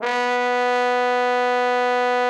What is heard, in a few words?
TROMBONE; Best Service